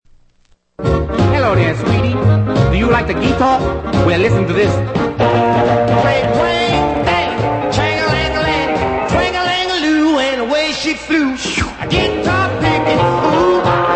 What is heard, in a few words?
guitar